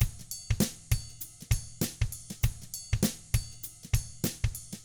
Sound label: FUNKY Drums; ILIO; Double Platinum Drums 1; 99 FUNKY 2